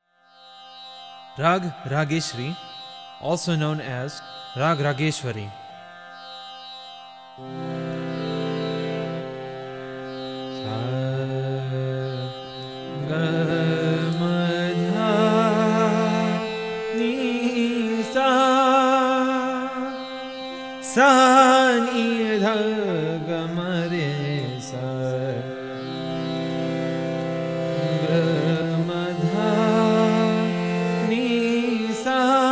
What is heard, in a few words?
rage sri; raga